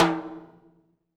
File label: TIMBALE, HR-16 Drum Mashines; EdgeSounds